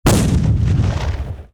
cannon fire

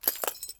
step walk broken glass

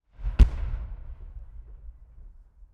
tank cannon, projectile

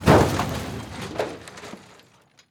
trash crash, trash debris crash

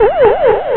UFO; ufo transmission

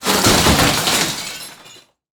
debris crash

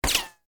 bullet fly by ricochet

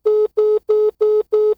cell phone tone

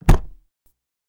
briefcase drop

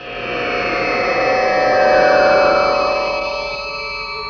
LANDING, propulsion

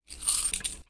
coins counting; moneybox; coins drop in moneybox

